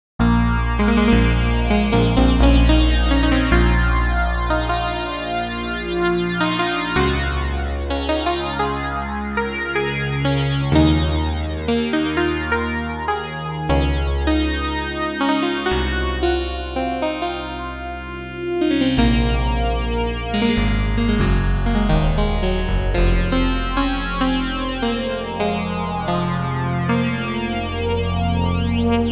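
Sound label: lead strong analog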